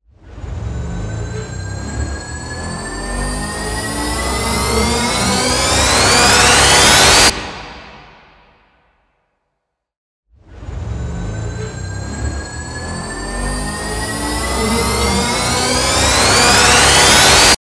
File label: spaceship engine start